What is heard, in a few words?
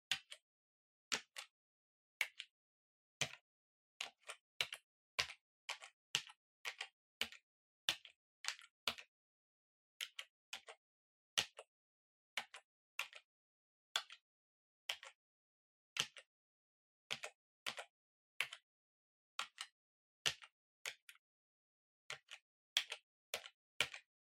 PC Keyboard Far Gentle